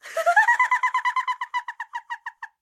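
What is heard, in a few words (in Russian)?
женский легкий смех тоненьким голосом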